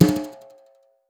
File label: Steel Brush, Snares; Ghosthack - Free Snares 2023